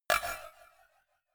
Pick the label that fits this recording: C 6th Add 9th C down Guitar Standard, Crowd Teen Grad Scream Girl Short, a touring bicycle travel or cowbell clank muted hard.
cowbell clank muted hard